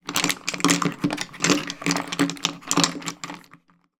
household nail varnish pots glass many in box rummage